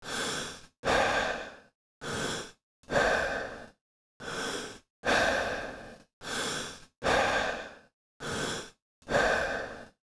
breathing

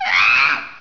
monky die; ambience

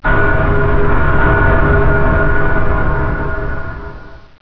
bang

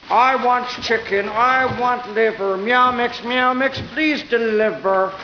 meow mix

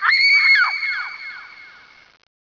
woman screaming distant

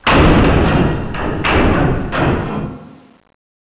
monkey clank; ambience

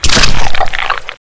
skewer, ambience

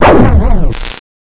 force field shutdown

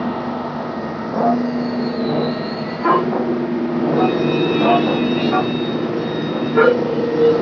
pipe hums

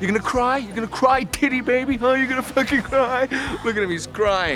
cry

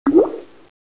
water drop, ambience

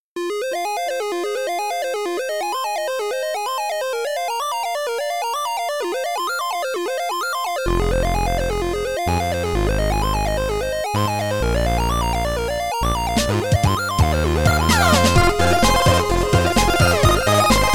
space 8bit

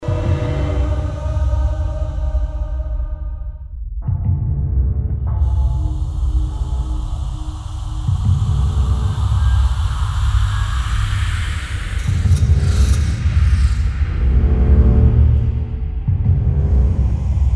amb osiris tomb

